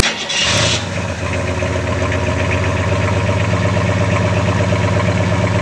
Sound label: engine start; CD-ROM Premiere CD-ROM Sampler (Time Warner Interactive Group) (1993)